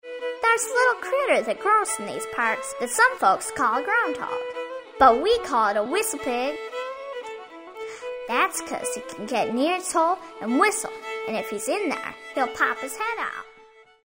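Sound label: whistle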